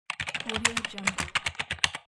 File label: keyboard